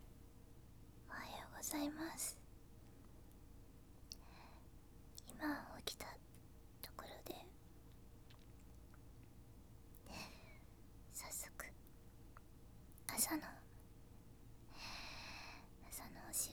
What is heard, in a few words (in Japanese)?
朝起きておしっこへ; 寝起きおしっこ